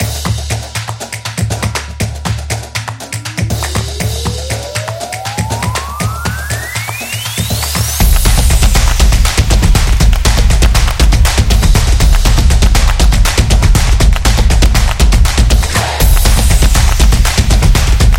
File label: Beat Fast